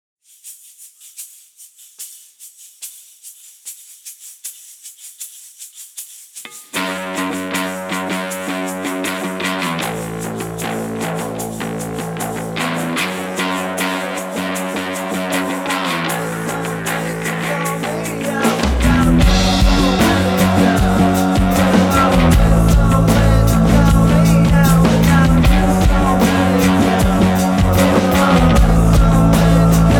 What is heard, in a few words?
Diegetic Bar Song